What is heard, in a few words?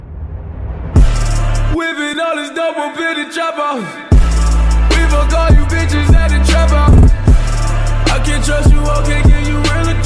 trap house